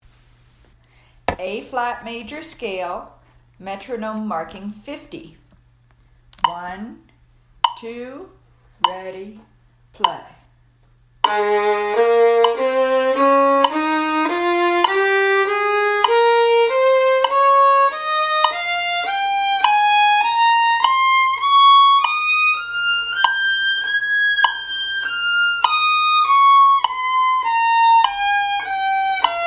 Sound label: Ab major scale met 50